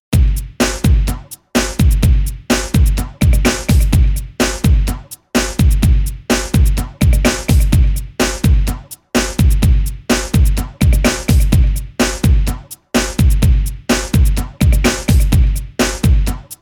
beats loop track bpm 126

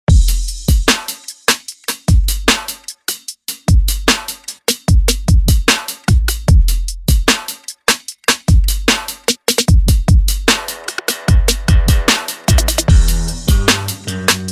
bpm 150

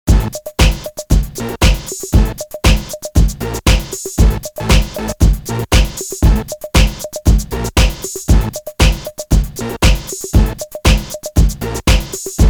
instrumental loop bpm 117

